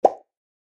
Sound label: msg alt